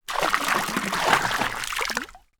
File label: Water; TOOLKIT vol.2